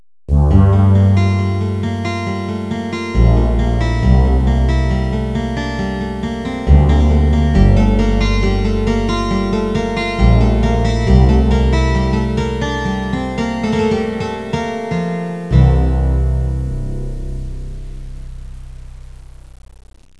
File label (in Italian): musica newage